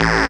DR. LOOP, BUZZ; X-Static Goldmine 3

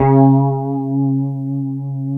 D 3 STRAT MF, HYPED STRAT, Guitars; Spectrasonics; Hans Zimmer Guitars VOL-1; 90's Sample CDs

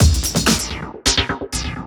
Fills 128BPM, Clubsounds

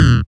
SYN OLD, Dance Synth; Sound & Vision - Gigapack I CD 2

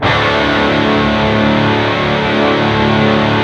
PWR CHRD D#2, Guitars; PRS

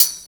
TAMB; East West - Phat & Phunky

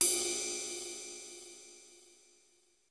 Ride, Roland JV 1080, Drums

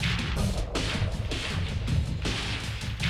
Classic DrumLoops; Best Service; XXL 1500 Classic DrumLoops

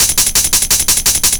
Shake 170, Drum&Bass Perc Loops; EZRollers

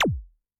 ET MooR XL, Tom, Yamaha DTXpress-II

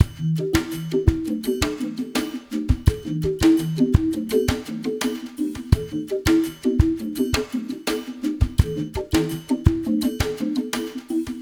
REMIX; Spectrasonics; Liquid Grooves 1 & 2; SAUNTER

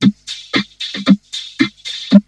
VOCO LOOP; ELEC-LOOPS; Syntec; Wall of Sounds; TECHNO-BOX

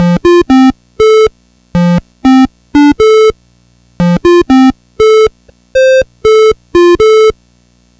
Atari 2600 Synth

